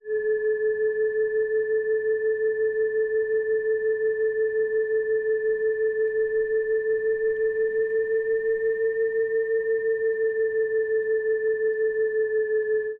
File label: model Y pass By backwards AVAS, electric vehicles